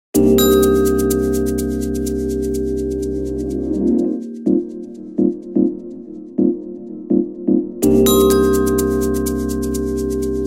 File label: Ice, Nokia Tones; Windows Phone apps